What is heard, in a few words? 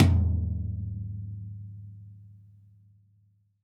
TD-20 Tom B, Roland TD-20 Toms